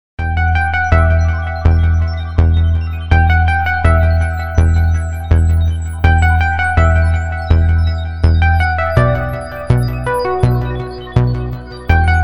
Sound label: italo music